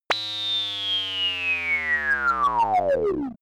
buzzing degradation

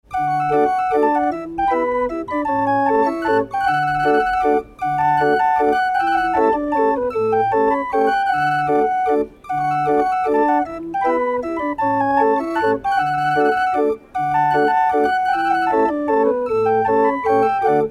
hurdy-gurdy

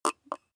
tea sip short

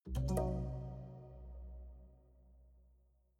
haunted strings chord diminished damped

